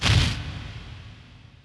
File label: frost hit; RYL